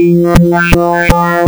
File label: PPG wavetables; waveedit256